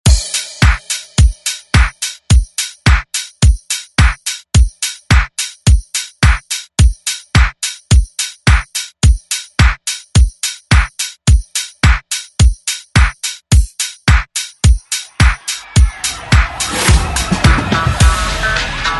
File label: 90s Re Drum; DJ Mhark